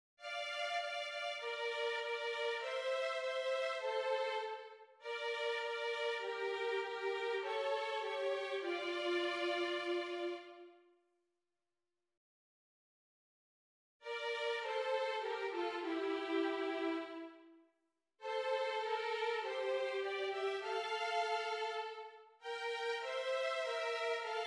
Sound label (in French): VIOLONS